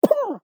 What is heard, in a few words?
player hit; fallguys